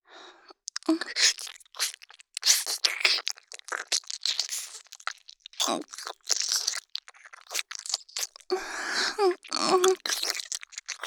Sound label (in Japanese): ハード耳舐め